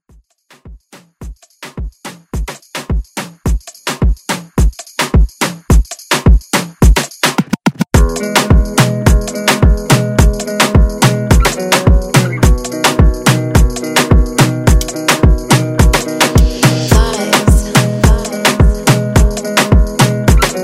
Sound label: Moombahton Redrum